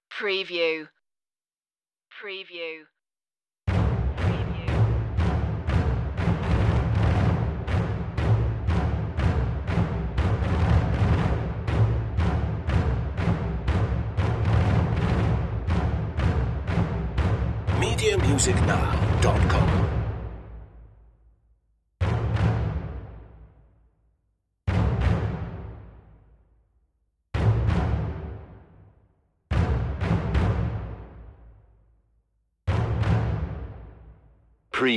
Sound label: pirate attack drums only